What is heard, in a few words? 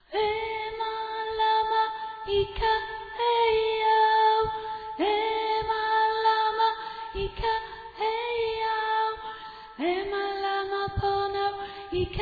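soprano; manchester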